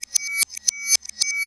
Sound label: Rev Triangle, Mixed Percussion; Zero-G Jungle Warfare 1, 2 & 3; 90 s Sample CDs